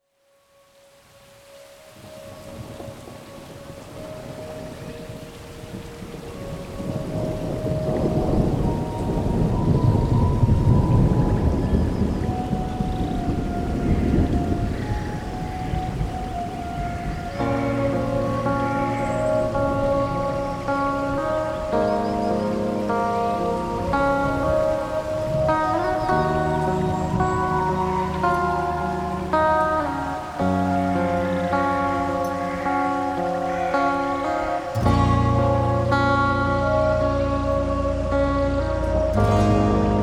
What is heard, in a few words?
Heavy Rain